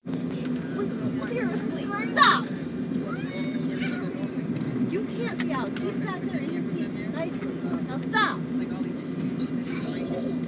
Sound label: Airline Screaming Child